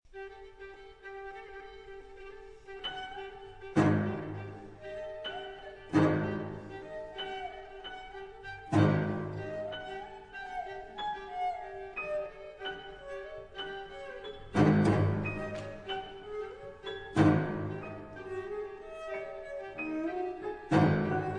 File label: Sheng